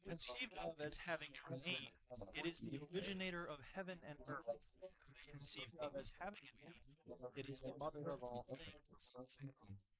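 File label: male, instantaneous mixture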